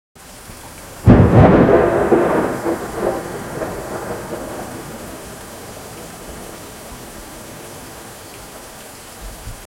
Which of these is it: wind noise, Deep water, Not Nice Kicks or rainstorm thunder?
rainstorm thunder